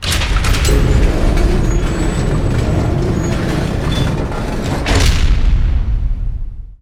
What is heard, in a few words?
Door Open